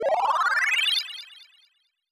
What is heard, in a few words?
synthesizer ascend long